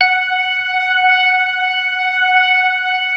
ORGAN 4 DRAWBARS; Keith Emerson Signature Series

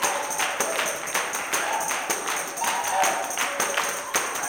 160 TAMB BRK, blues soul; Spectrasonics; Vocal Planet

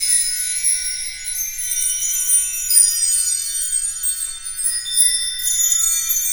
BELL TREE; 3D Sound; 3D Keys VOL-1

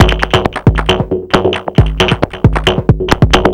Drums Strange

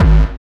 BD, BD Dance

